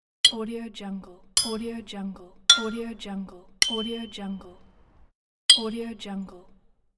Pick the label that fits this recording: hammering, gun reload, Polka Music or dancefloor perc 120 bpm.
hammering